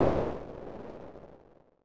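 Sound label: explosion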